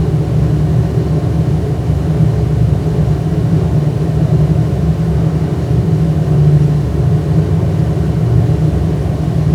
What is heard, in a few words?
industrial vent blow